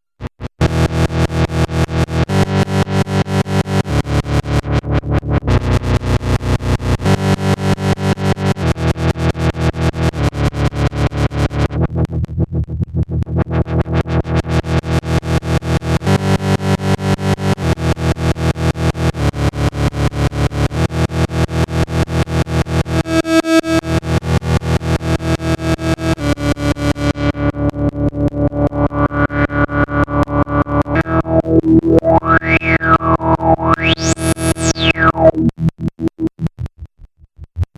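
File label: seq, Compact analog monophonic synthesizer; MFB nanozwerg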